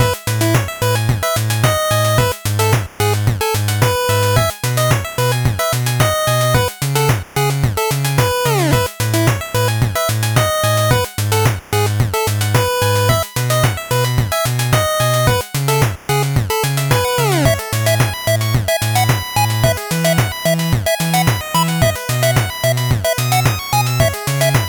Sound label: 8bit bgm slow